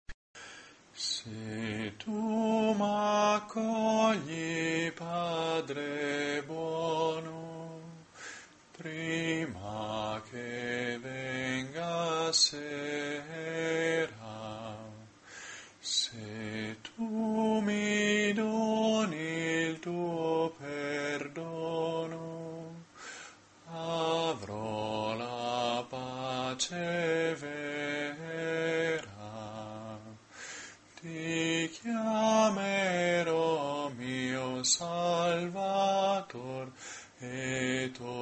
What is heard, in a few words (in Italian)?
bassi; coro